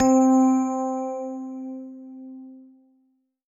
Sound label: Keys; Space Jamz